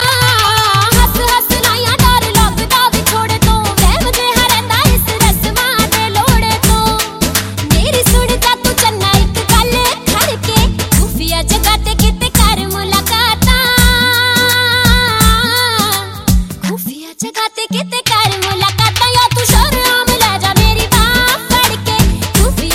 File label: Punjabi